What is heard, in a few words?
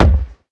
ball impact ground